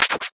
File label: scratch; draw the rest